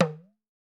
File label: TAM TAM, African Drums; E-MU Formula 4000 Series Vol. 4 – Earth Tones